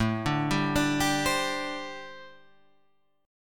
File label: C Suspended 2nd A; arpeggiated; chord; JGuitar